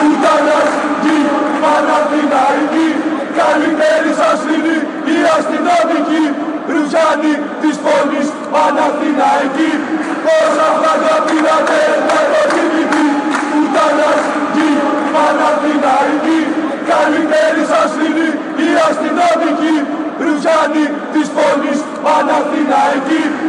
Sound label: fanchants, greece; rouphianoi tes poles